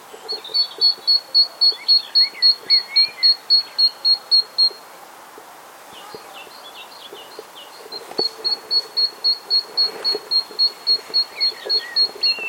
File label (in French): mesange charbonniere